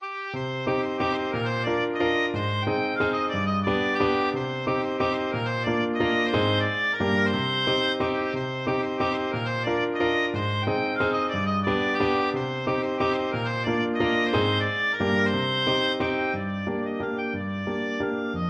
marchoise limousine, oboe

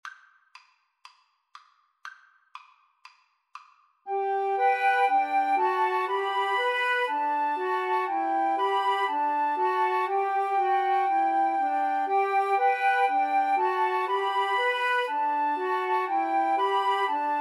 Flute Trio

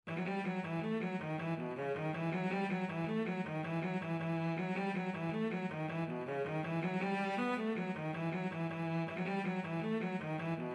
jig, cello

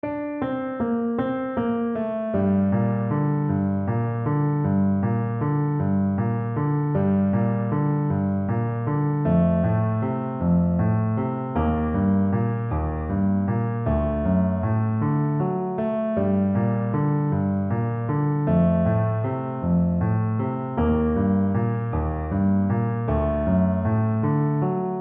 spanish violin

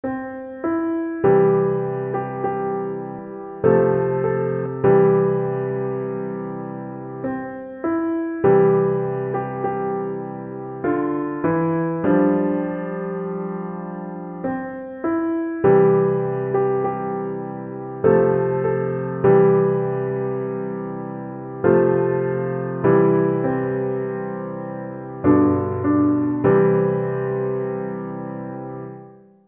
Kum Ba Yah pno, piano

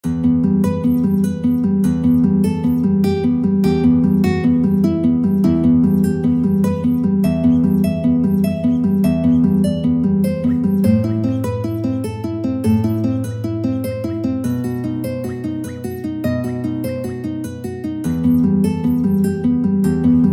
spanish romance, guitar

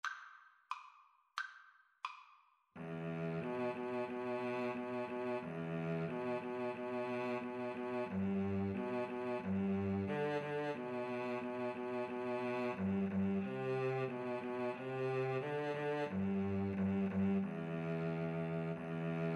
cello duet

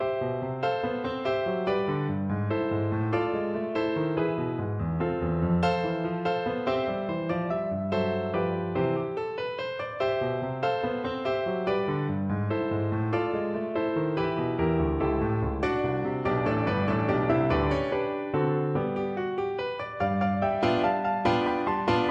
la paila, piano

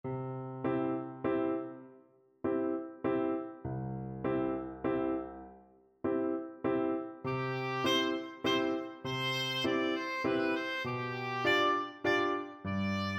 saint saens elephant, oboe

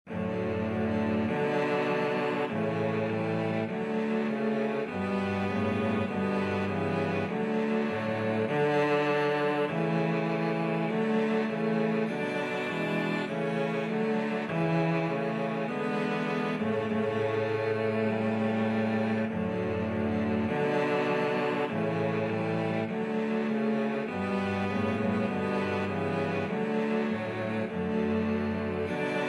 cello quartet

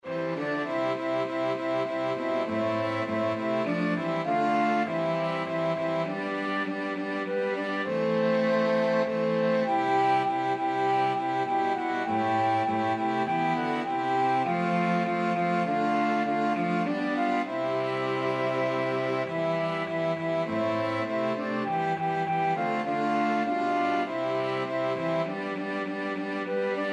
flexible mixed ensemble, 4 players

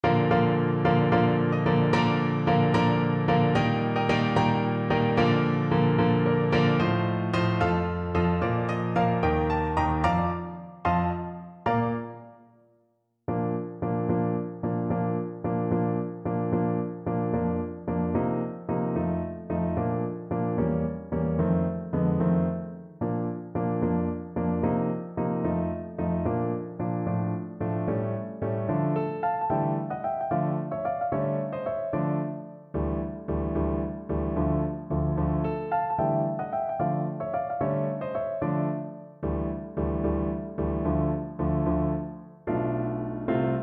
pirate king, Trombone

